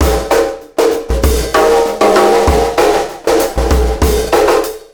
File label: Beat, Processed Drumkits; Event Horizon